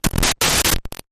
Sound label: Quick Static Burst